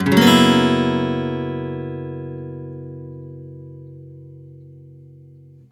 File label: F# 7 11 downstroke, Acoustic Guitar; Sonic Foundry (Sony Creative Software); Songwriter Acoustic Guitar Companion; One Shot Chords